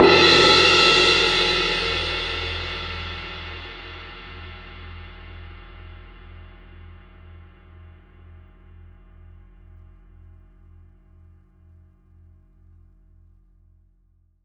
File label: Cymbal Hit A High; Sonic Foundry (Sony Creative Software); The Electronic Music Manuscript Collection